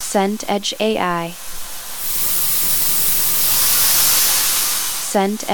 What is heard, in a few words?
wind gentle grass